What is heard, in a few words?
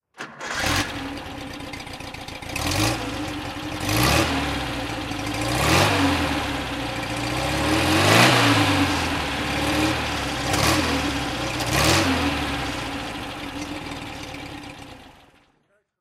porsche 912 white throttle